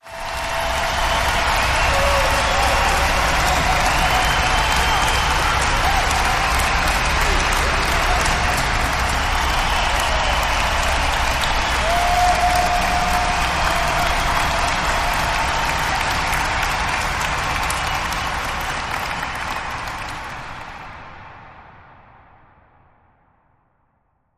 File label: Fan Unity Cheer Atmosphere